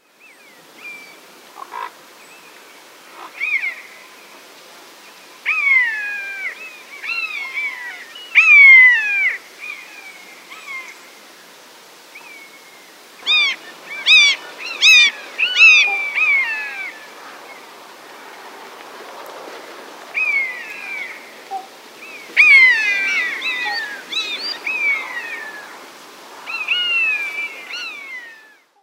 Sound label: buzzard